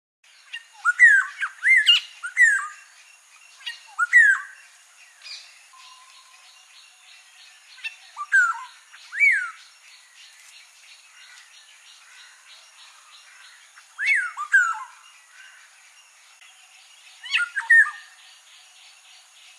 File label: Black hooded Oriole; Sri Lanka